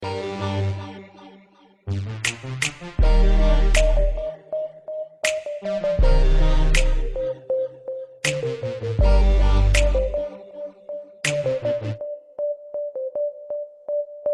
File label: Hot Beat